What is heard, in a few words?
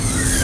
SLIDEOUT